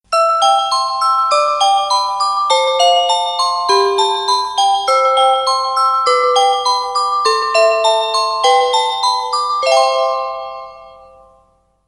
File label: Spring